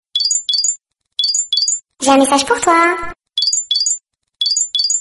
sms french; ringtone

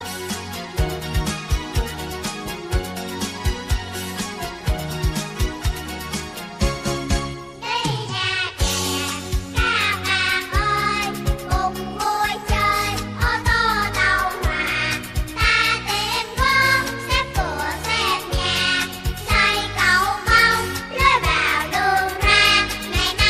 vietnamese kid ringtone

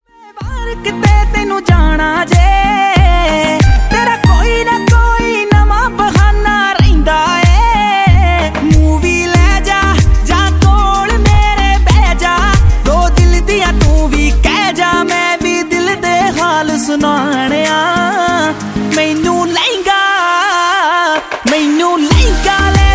punjabi ringtone

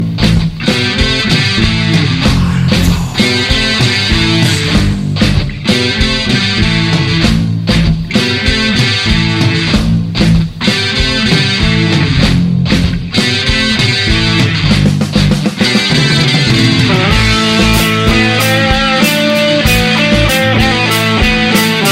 metal stigmata

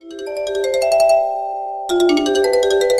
sony remix ringtone

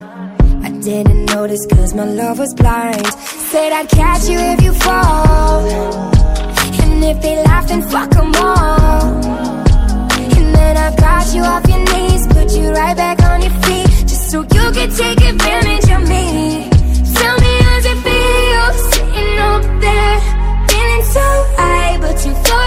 nigarian ringtone

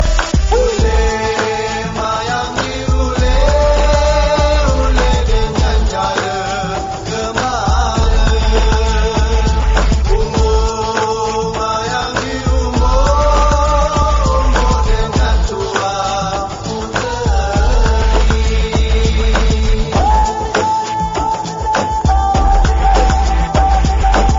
chakra remix

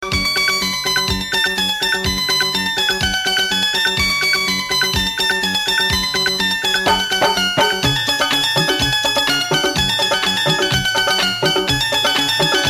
guitar; hahk

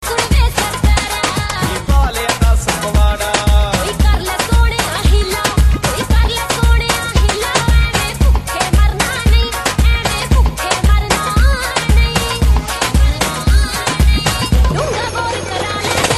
remix punjabi ringtone

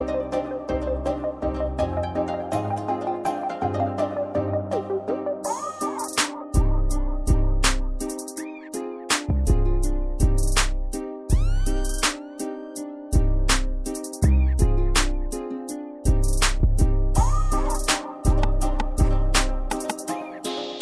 type beat

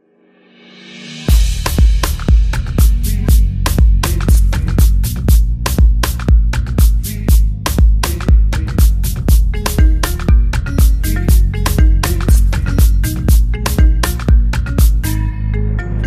south african instrumental; ringtone

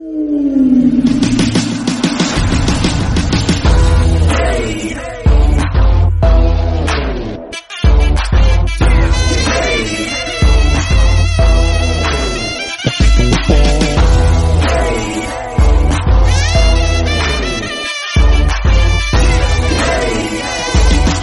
bgm; cia

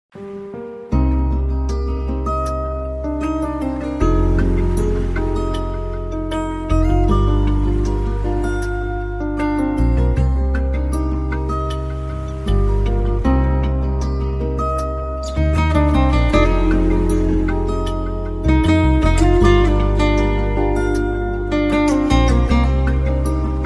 arabic guitar

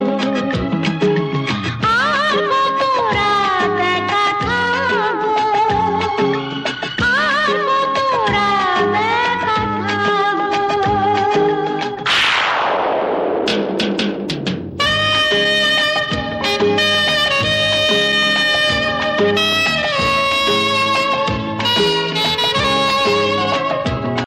bengali ringtone

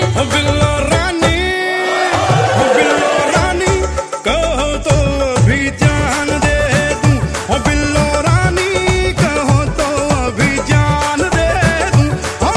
hindi hit song; dhan dhana dhan goal 2007

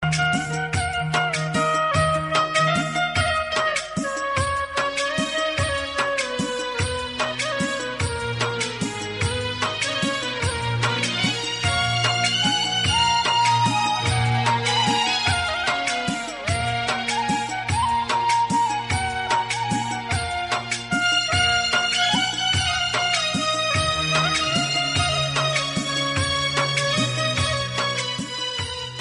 pashto cool music ringtone

